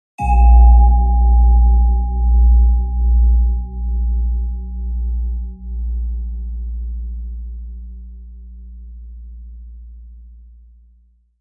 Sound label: Bell Chime Lfe Reverb